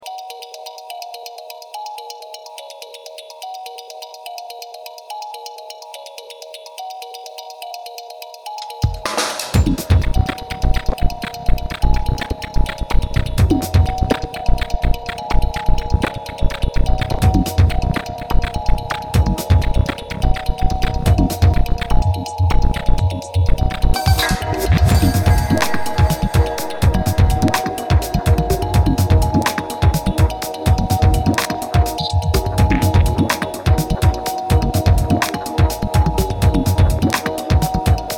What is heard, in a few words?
Remix